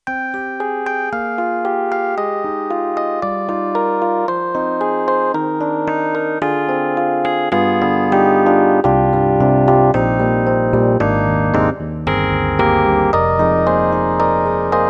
YAMAHA MU1000EX, 4 Way EP

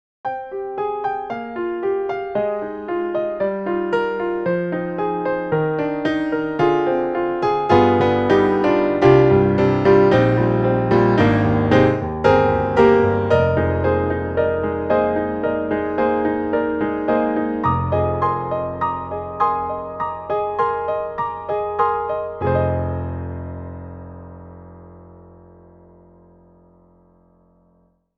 EXS24 mkII Yamaha Grand Piano; Apple; Logic

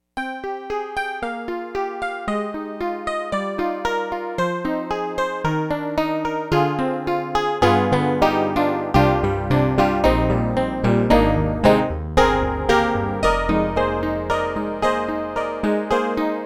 Honky-Tonk; Roland D-110

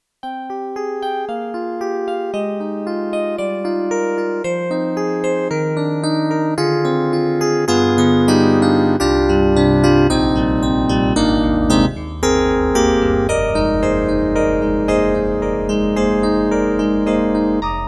Yamaha MU1000EX E Piano 1

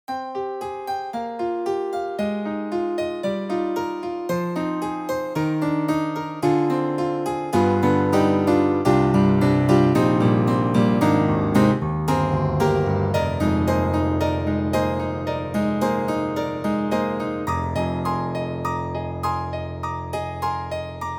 YAMAHA MOTIF-RACK XS House Piano

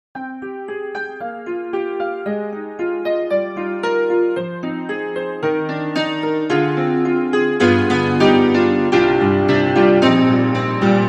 KORG KRONOS 2 Piano

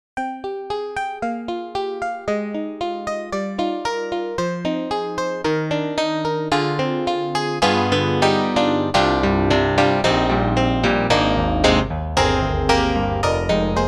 FM Grand; Steinberg HALion 7

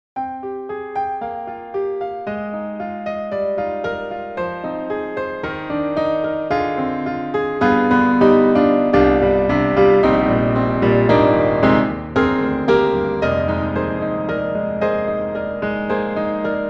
Grand Pianos, Bosendorfer, Cathedral Bosendorfer; Synthogy; IvoryII

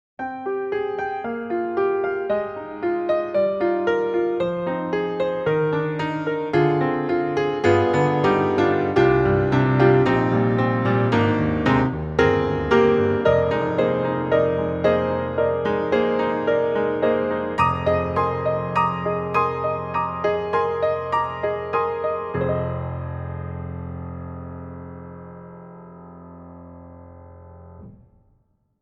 1955 Steinway D hammer; Embertone; Walker

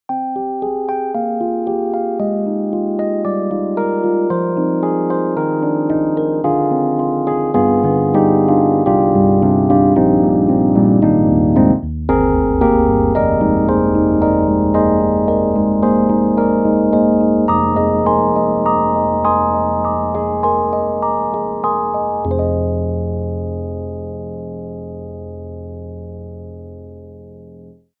Studio Rhodes; EDIROL; Super Quartet

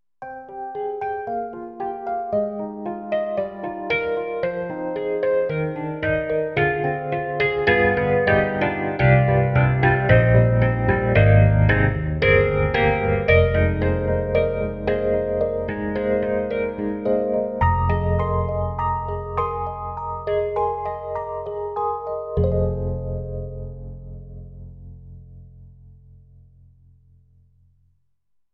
SD-90 Stage 73; EDIROL

